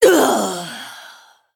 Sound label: Female Dragon Death